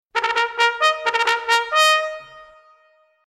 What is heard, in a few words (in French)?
Sonnerie Sabre A La Main